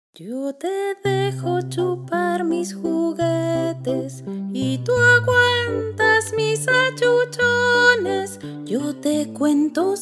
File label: piano